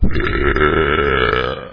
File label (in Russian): toshnota